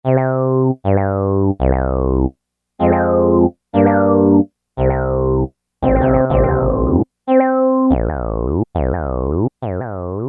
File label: Kurzweil K2000 Talk Talk; Kurzweil K2000 V3; Factory Programs